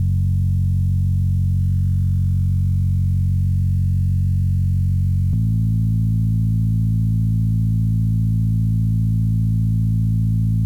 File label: Sub